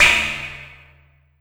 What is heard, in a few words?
PERC, Drum & Bass, 170 BPM